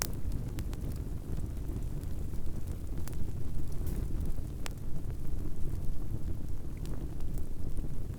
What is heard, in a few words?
Fire